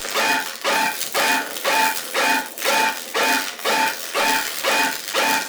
Scanner Jammed Loop; Entrati; Swarm

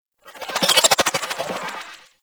Protea Fan Energy Trench Projectile; Warframe

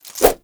Nunchaku Start Whoosh; Warframe; Tenno

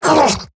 Pain Eximus Grineer Male; Battle Barks

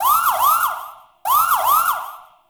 Arcade Misc; Warframe; Ambience; Nineteen Ninety Nine

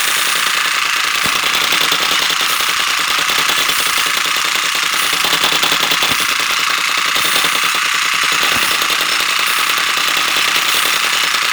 Veilbreaker Jetpack Engine Loop; Warframe; Vehicles

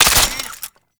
Belt Fed Rifle Pre Fire B; Warframe; Tenno; Frumentarius

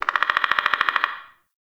Forest Animal; Ambience; Forest Animals

